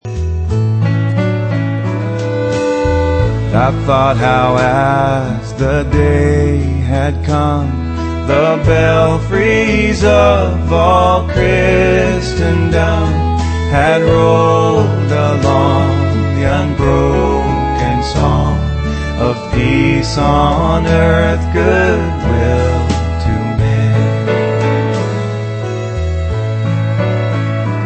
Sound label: Bells